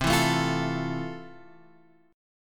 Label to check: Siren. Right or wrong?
Wrong